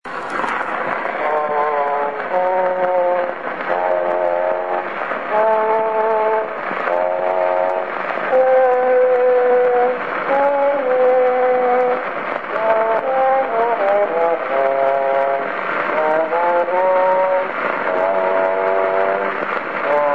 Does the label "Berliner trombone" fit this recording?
Yes